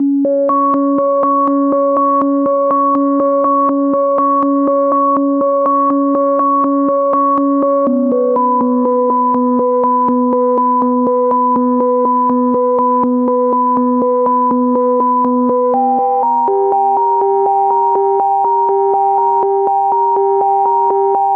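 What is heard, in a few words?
122 BPM Melodic Arps A major F minor Tonic B; MMB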